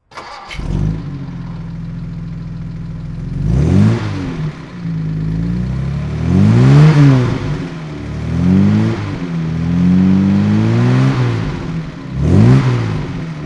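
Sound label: jaguar xk120 fhc throttle, Engine sound; Jaguar XK 120 Fixed Head Coupe, 1952; V3D/ ClassicarImages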